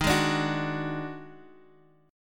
D sharp Minor Major 7th Double Flat 5th D sharp, down; strummed; chord